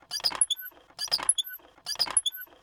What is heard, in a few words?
Rotation, Metal, Crank, Gears, Squeak, Rattle, Loop, Short; Hzandbits; Elegant Machines